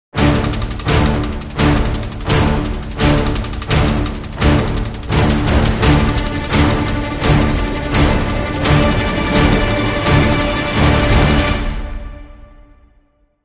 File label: Dramatic Sting